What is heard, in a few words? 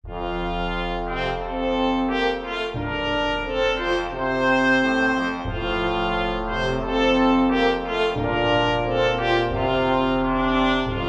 Jingle Positive; The Guild 2 Renaissance